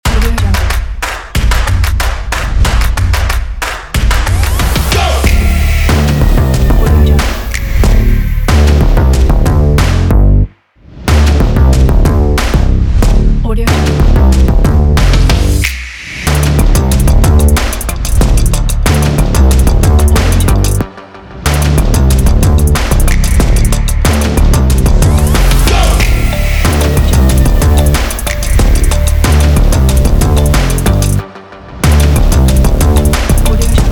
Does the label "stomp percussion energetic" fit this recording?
Yes